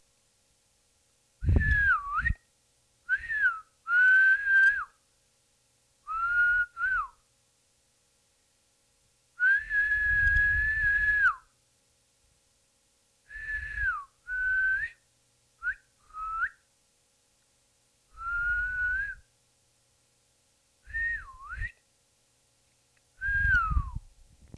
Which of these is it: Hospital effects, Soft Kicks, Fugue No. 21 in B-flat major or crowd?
crowd